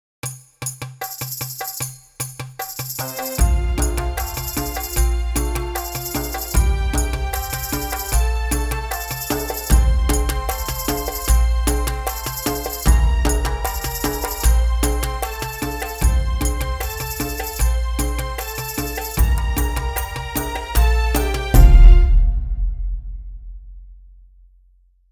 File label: Moroccan Folk Song